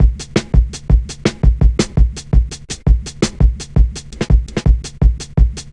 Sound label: Drum Loops, Jungle; Zero-G Jungle Warfare 1, 2 & 3